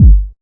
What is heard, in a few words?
125 DRM, Fresh Disco House; USB Soundscan vol.20; AKAI